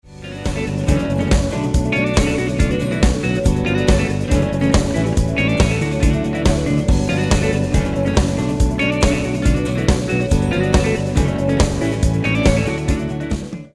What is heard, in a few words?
EG Riff Mixed